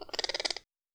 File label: exotic bird sounds; soundscrate